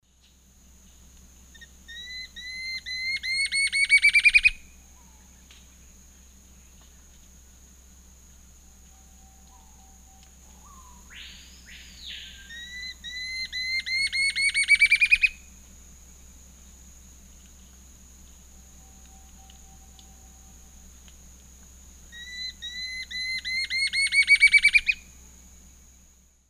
Campylorhamphus procurvoides, song; S. America; Eastern Lowlands

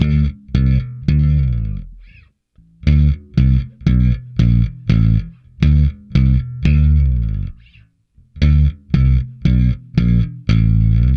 Bass D Funk

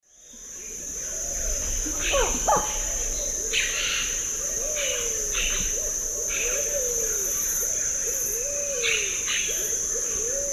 Heliornis fulica, call; S. America; Eastern Lowlands

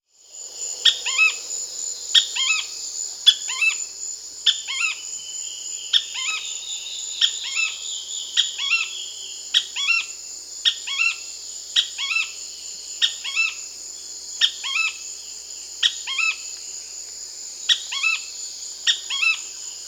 Ruddy Spinetail (Synallaxis rutilans), Peru; S. America

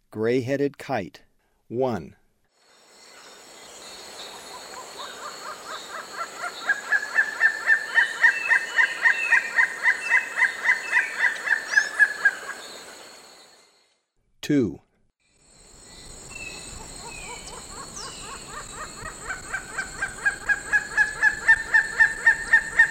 Gray-headed Kite; S. America; Eastern Lowlands

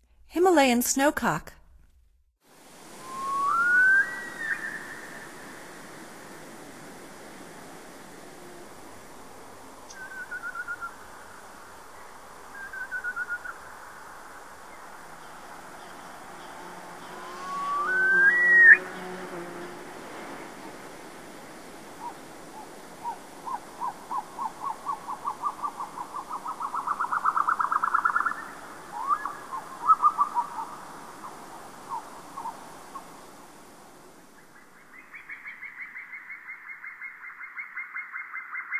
Himalayan Snowcock; North America; Stokes

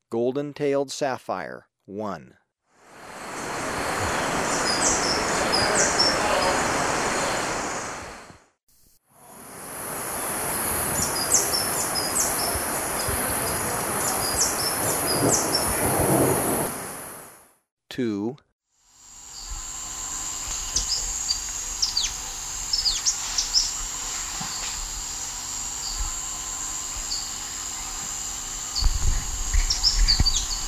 Golden-Tailed Sapphire, Birds of Ecuador; S. America

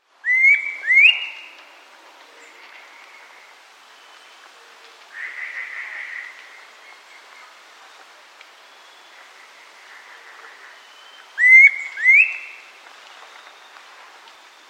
Santa Marta Antpitta; S. America

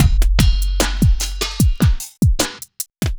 hiphop

